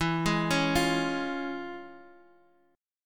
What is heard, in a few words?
F Minor Major 7th Sharp 5th, E